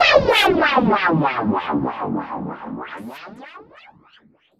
Downsweeps

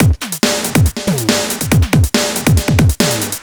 Breakbeat 140 BPM

